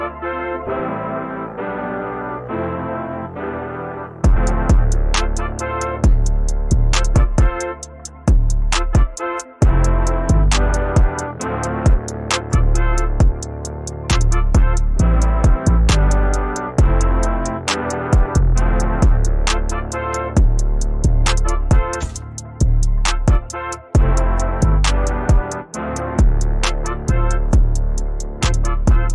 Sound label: Instrumental English Ringtone